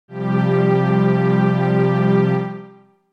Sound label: G C Suspended4